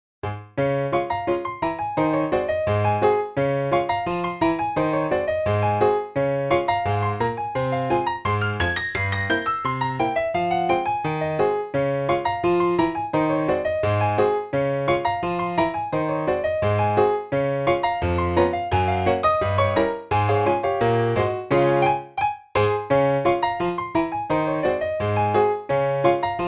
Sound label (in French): Piano seul; James Scott